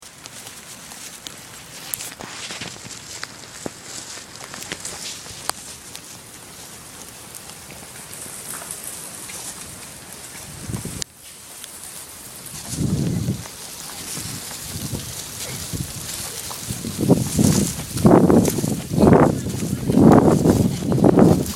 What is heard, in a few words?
Rain; Location: Dutch Treats